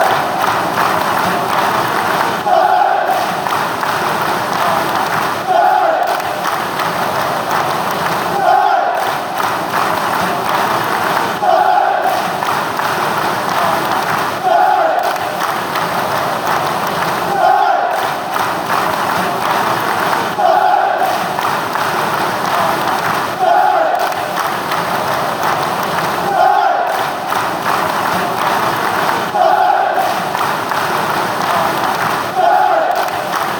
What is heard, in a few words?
celtic claps fanchants